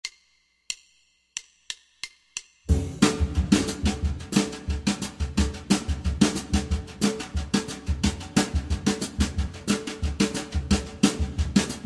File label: Trombone Montuno